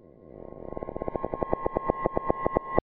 Movements; 99Sounds; The Weird Side Samples

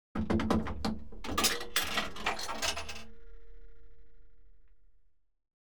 BT Payphones, Pushbutton, Rece; Computers Printers Phones; BBC Sound Effects Library

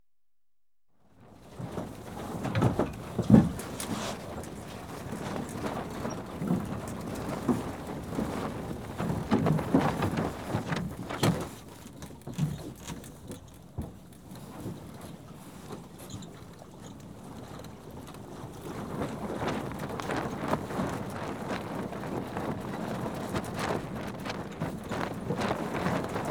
Sailing Dinghy, General Activity; Ships And Boats; BBC Sound Effects Library; Ships And Boats 2

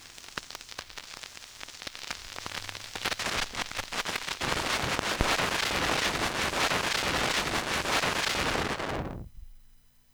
vinyl crackle; 99Sounds; Vinyl Noise SFX

